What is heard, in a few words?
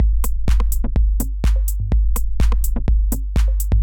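Minimal beat 125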